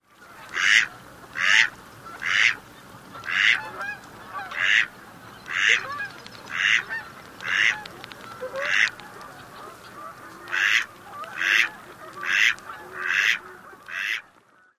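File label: male rhaeb calls, bird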